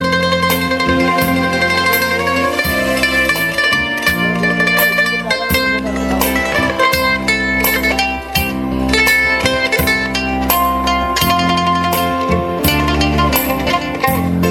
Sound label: sri lankan music ringtone